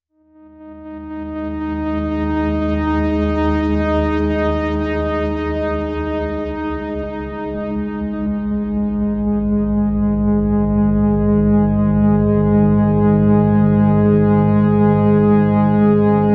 FM Melodic; Sonic Foundry (Sony Creative Software); Slow Silhouette

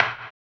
HAMBONE, Vocal Blues Soul; Spectrasonics; Planet

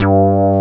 Synth Bass, Analog Bs

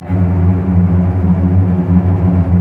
STR, Roland LA Composer; Roland - LA Composer vol. 1

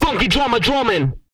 DRUMMER; ILIO; Vocal Planet

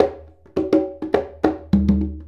Congas; Essential Percussion

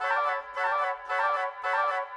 Flute Oboe, Orchestral; Sonic Foundry (Sony Creative Software); Orchestral VOL-2; Modern 10

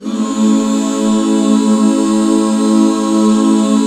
A MAJ, Back Chords; Sound & Vision - Gigapack II CD 1

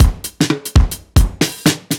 Kit 2 Heavy Swing 120; off the grid

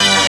synth, Roland, dance synth; DANCE SYNTH1; JAMES

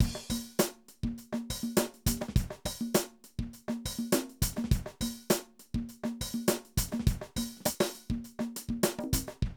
Drum loops, 70s funk

